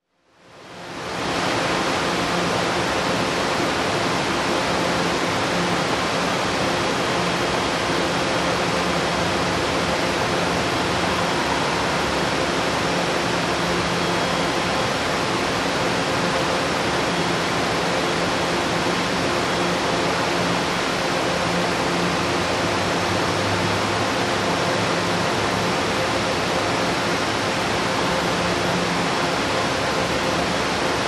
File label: Industrial Area ambience